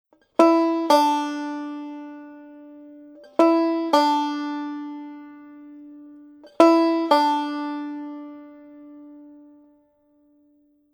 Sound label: Pull Off Melody